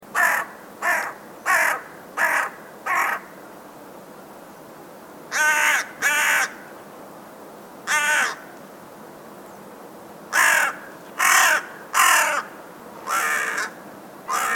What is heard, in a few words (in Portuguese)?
corvo efeito sonoro